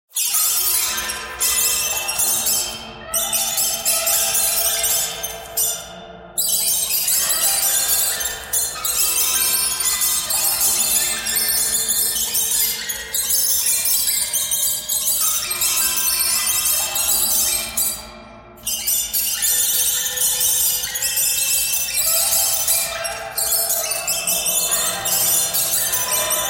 birds birds thread harmonic

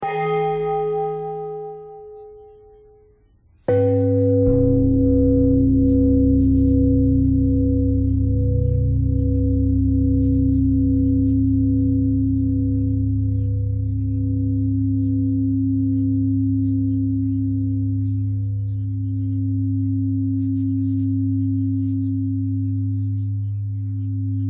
bell bell thread simple, bells

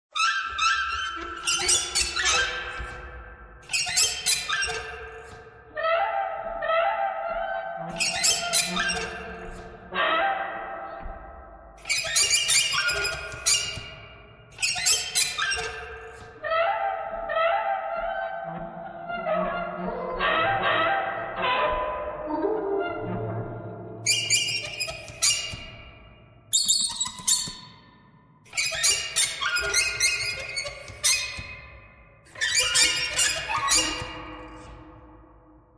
birds thread buzz

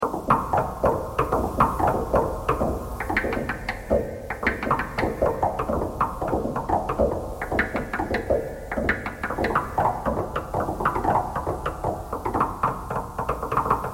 knocking thread noise all

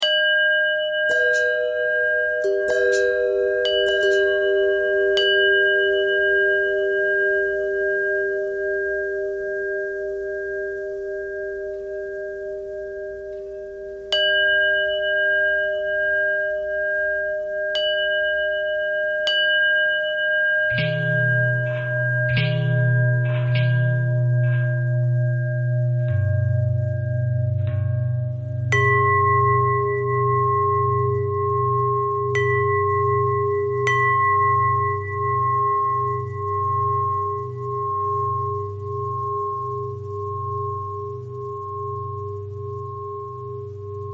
long bell bell thread simple, bells

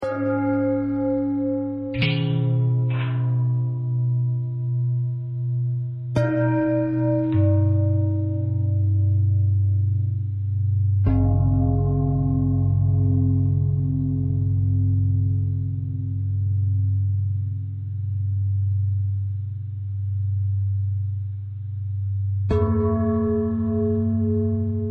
long bell bell thread pentatonic, bells

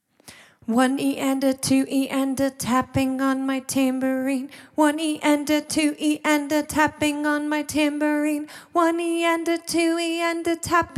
Sixteenth Note Tambourine

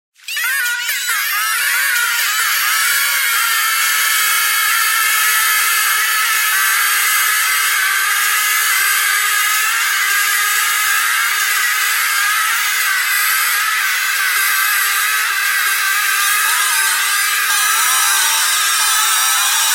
bagpipe geese bagpipe geese thread noise

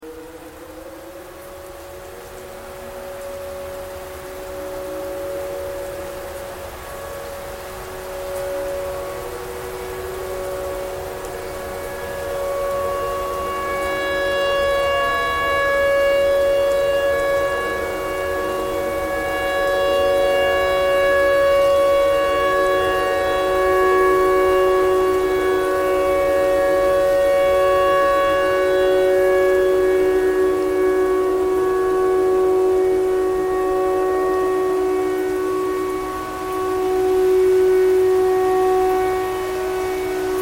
tornado siren tornado siren thread simple

